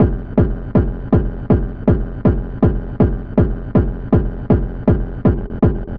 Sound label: Beats Idle Loop; Angerfoot Beats 02